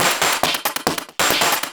percussion loops, Techno; PS-45

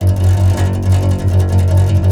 C. Bass Balalaika, Plucked Strings

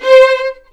C5, VLNS ARCO; East West; The Ultimate String Collection

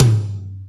Yamaha EX-5

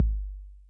Kick Accent Mid Tone Max Decay, 808

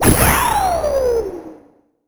Misc Short FX

